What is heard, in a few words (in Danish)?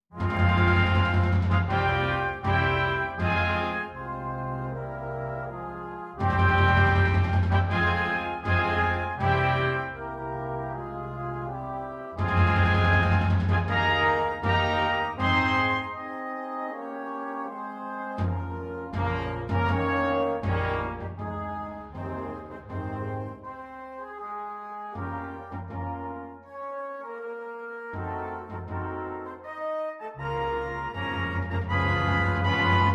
Sørge Marsch